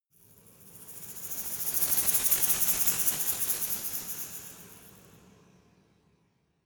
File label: resonator rattle dynamic